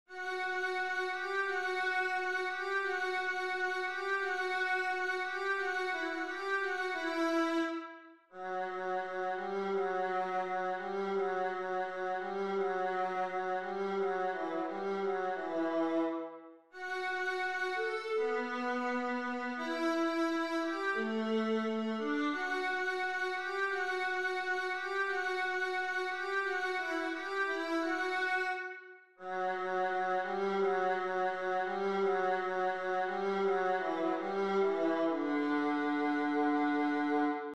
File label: autumn viola melody